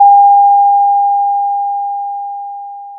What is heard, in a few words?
Sound of a doorbell